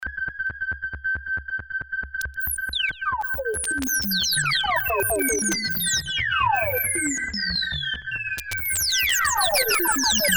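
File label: fx bubble, minimax; Creamware minimax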